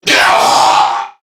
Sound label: Death Crewmen; Warframe; CorpusCrewmen; CrewmanA